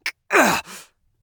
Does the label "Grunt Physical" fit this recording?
Yes